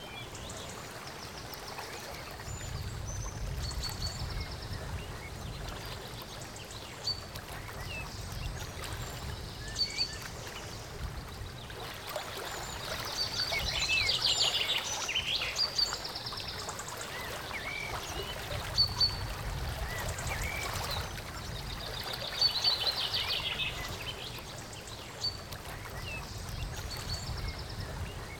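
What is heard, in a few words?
Scottish inn distance evening; The 39 Steps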